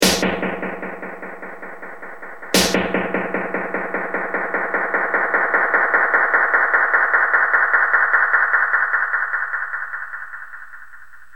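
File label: snare dm100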